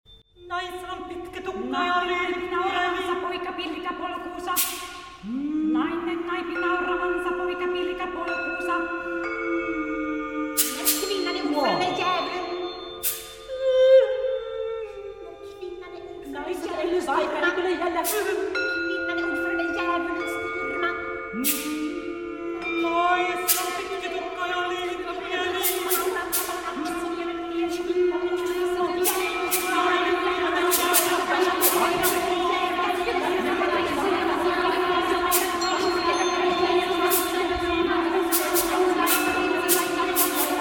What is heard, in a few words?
choir, mixed chorus; Puksånger